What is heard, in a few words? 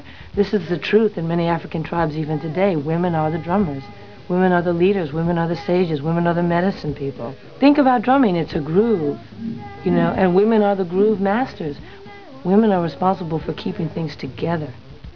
drums